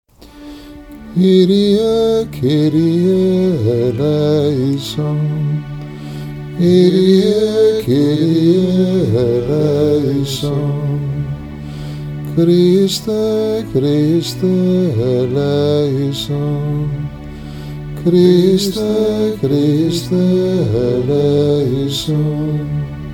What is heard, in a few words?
Kyrie Taizè